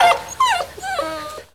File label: SE GAME BREAK; maimai